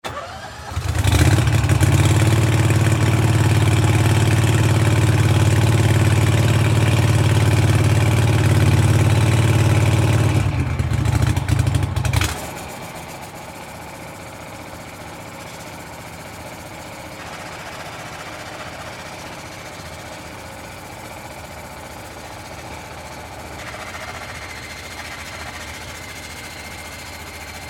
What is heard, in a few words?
Emergency Fire Pump Operate Splash Water Police Marine Division Boat; Emergency Vehicle; SFX Bible